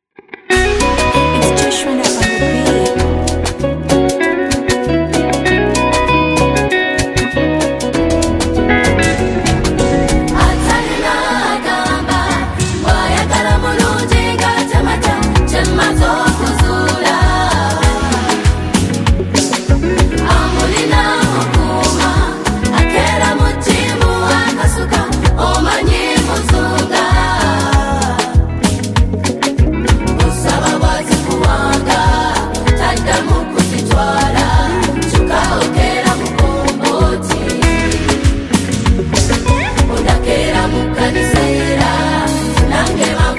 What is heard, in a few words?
Atalina Performance By Choir; Kennedy Sec. School; zambian gospel music